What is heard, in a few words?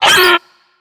minion explo death; Attack of the Radioactive Thing